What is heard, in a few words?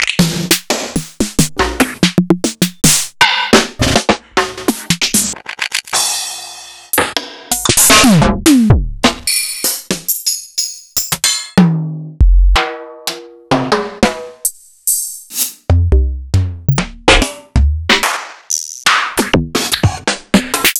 EMU Xtreme Lead